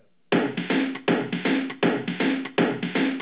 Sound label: drum